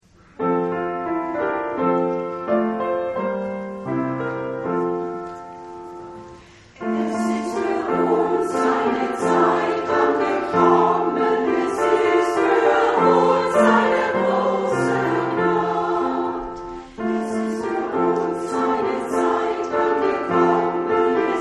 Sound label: chor